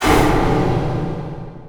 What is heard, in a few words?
shadow poison damage; weapons; dota; shadow demon